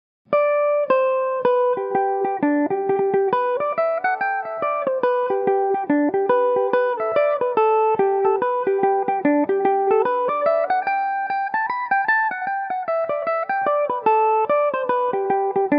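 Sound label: reel G major, irish mandolin; honeymoon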